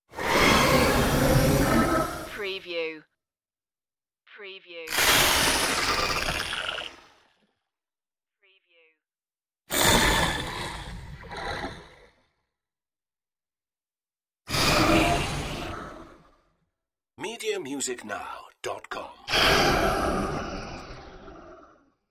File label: alien escape flybys